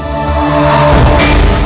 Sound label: open large; philippines